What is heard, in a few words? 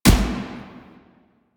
Assault Rifle Fire; Weapons; Warframe; Grineer